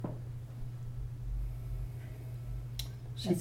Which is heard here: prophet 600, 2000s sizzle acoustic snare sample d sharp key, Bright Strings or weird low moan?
weird low moan